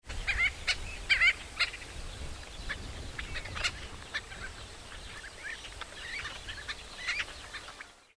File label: gull billed tern